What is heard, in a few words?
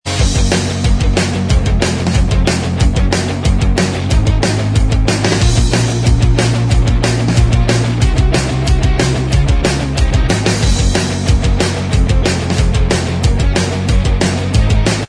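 punked up instrumental track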